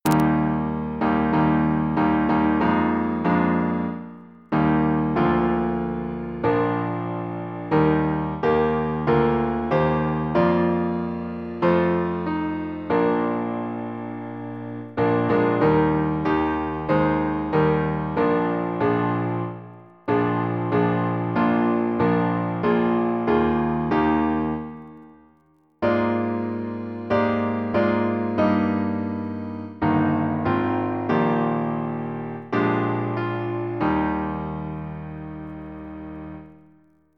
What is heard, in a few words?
Gloria Patri piano